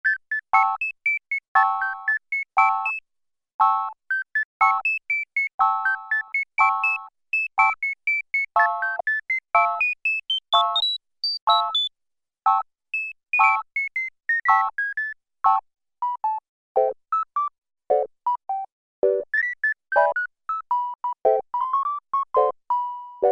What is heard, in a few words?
HARMONIC PERCUSSION, Oberheim OB-8; Factory Patches